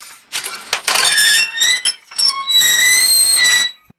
high pitched rusty metal squeaks

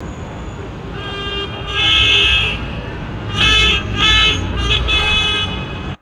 distant impatient car horns honking; vehicles

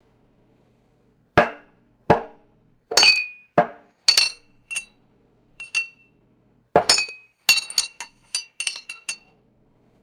dainty ceramic teacup clinks and impacts

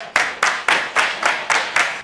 crowd clapping in unison indoors; crowds

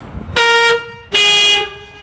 three short vehicle horn honks; horns

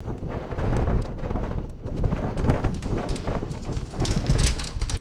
interior wind gust with window rattle

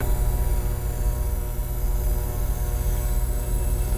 fluctuating electromagnetic energy hum; electricity

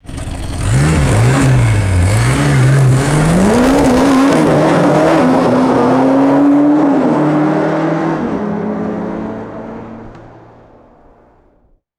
distant sports car hard acceleration; vehicles

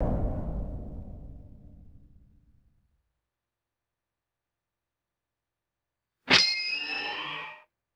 springy ringing metallic impact

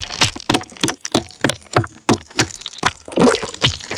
thick wet dung splatting on floor; liquid mud

